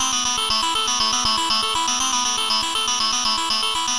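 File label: narrowpass trance synth arpeggio, 120 bpm; SYNTH AND ELECTRONIC LOOPS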